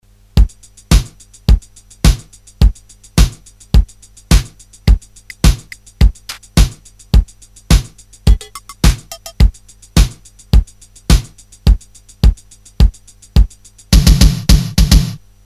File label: Hall Drums off